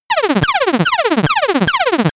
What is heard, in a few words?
lasergun